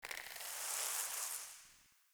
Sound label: Foliage Growth B; Powers; Fey Eldveil; Eldveil Stillness